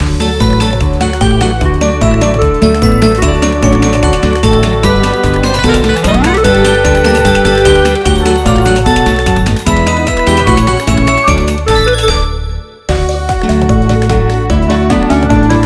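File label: vowels, letter Ya, karaoke; glasnyye bukvy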